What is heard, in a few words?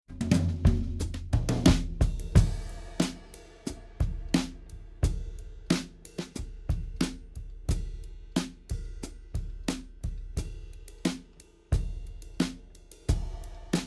drums sans